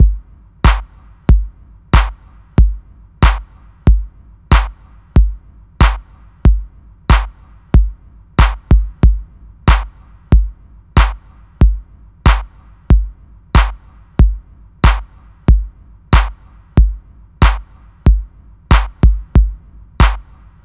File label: kick clap loop A, Drum Tracks, Smooth RnB